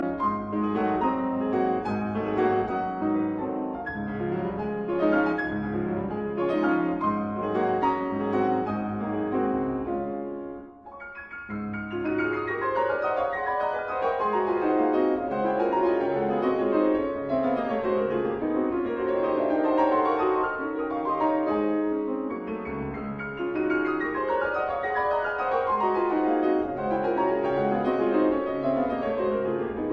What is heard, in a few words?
Allegro vivace; Weber; Aufforderung zum Tanze; Invitation to the Dance